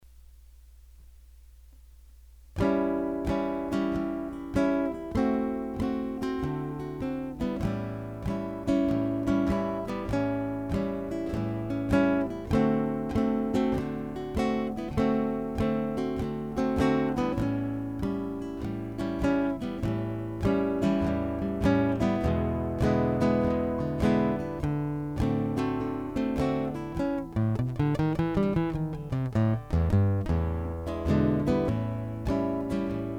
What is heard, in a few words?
12 Bar Blues, guitar